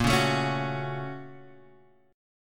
A sharp Minor Major 7th Sharp 5th, down, Guitar, Open G